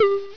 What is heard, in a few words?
alarm beep quiet; WoW Wotlk; GTFO